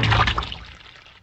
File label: splash